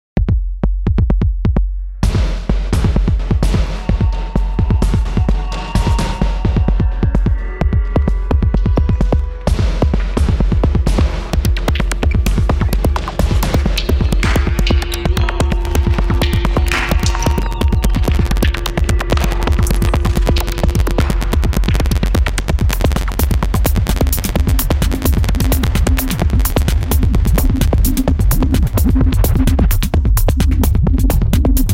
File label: Noise